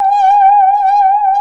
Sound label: saw danger, music, zombat